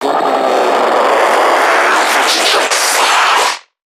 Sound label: Creatures Vocalisations Infected; soma; npc construct; Five Nights At Akers